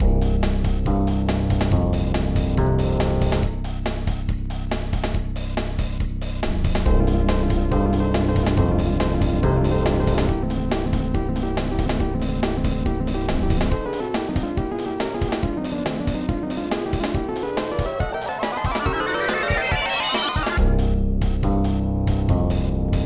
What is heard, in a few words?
loop it